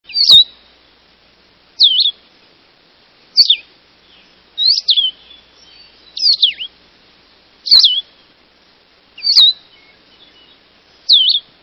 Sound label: Blue headed Vireo